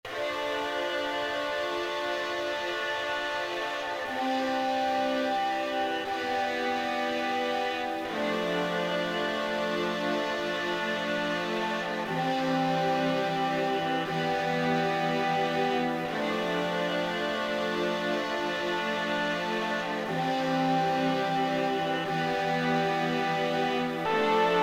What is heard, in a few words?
lofi music; weeklybeats 2024